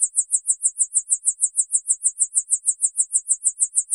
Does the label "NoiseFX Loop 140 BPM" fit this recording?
No